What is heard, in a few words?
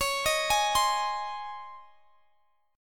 Db Major 7th Suspended 2nd Db; arpeggiated